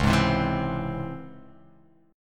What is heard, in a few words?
Db Minor Major 7th, DbmM7 chord; DbmM7 strummed; JGuitar